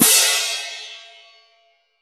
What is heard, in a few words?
Crash, Drum Hits; Zero-G; Soundclash